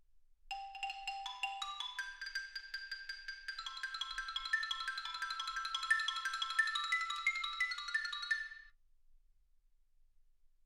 xylophone

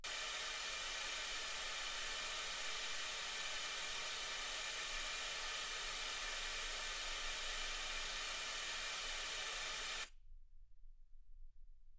wgn

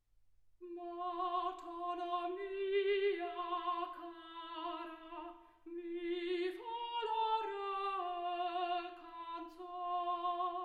soprano

